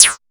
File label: percussion, drum machines, FutureRetro FR777